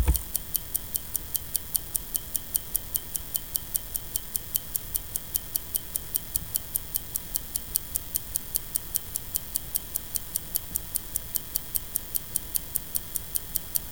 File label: molnija, pocket watch